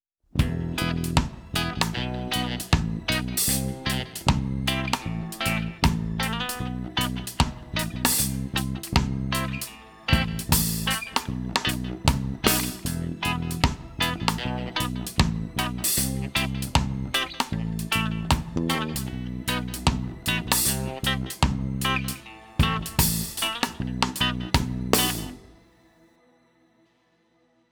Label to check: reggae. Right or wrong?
Right